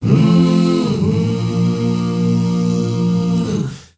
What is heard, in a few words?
HUMMR, MMM CHRDS; Spectrasonics; Heart of Africa 2